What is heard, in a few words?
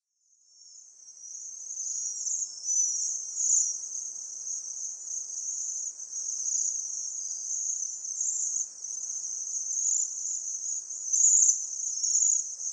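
Japanese waxwing, nasu; hirenjaku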